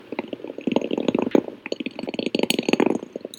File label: rolling sound